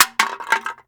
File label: Soda Can Fall; Crashes; 1001 Sound Effects